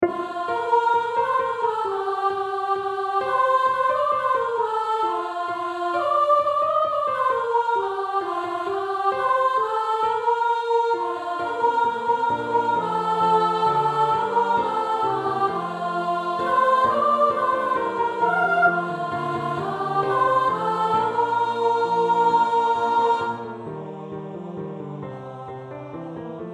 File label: Soprano; A Merry Christmas